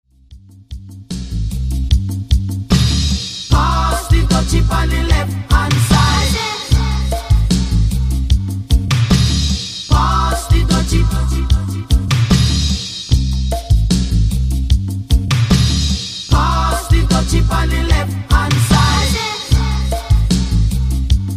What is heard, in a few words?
80s Reggae Break Intro 75 Bpm